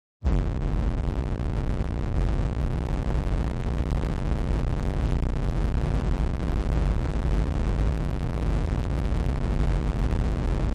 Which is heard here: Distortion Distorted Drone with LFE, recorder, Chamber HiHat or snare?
Distortion Distorted Drone with LFE